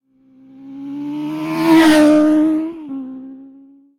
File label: motorbike; Blind Drive